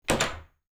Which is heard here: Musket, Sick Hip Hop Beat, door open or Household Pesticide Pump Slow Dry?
door open